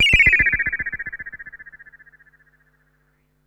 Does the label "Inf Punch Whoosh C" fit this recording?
No